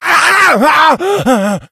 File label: rage at victim, infected, male